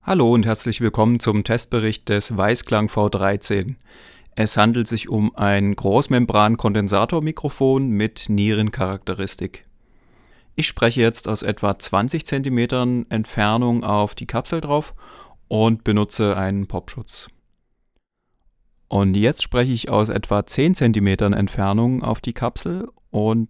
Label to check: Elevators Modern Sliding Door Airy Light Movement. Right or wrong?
Wrong